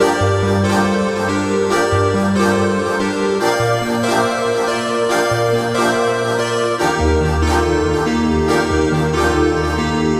free spin melody; Amatic; Hot Diamonds

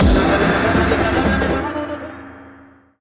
free spin init; Amatic; Diamond Monkey

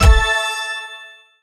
stop bonus; Amatic; Book of Fruits

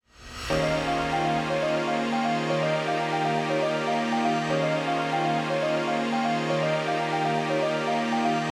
freespin init; Amatic; Book of Fruits

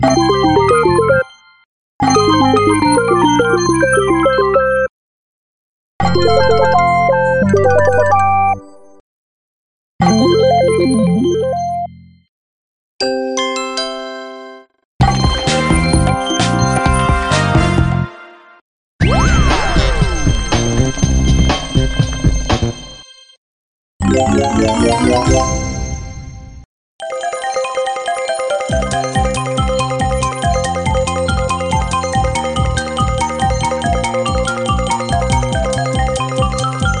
win sounds; EGT; Lucky Hot

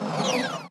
panel out; Amatic; Hot Diamonds

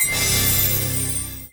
melody; Amatic; Diamond Monkey

